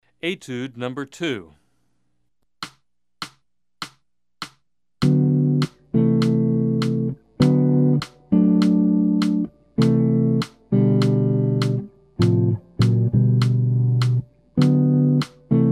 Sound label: Guitar Track Beginning Jazz Guitar; etude 02